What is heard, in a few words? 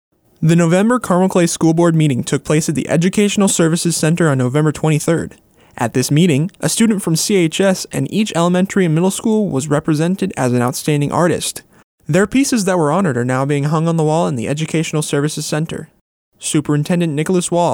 beat